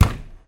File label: slam